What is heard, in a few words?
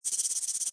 Cartoon Fast Scratch, Rapid scratching, or writing with pencil; Comedy/Cartoon FAST SCRATCH: Rapid scratching, or writing with pencil, drawing with pencil; Funny Sounds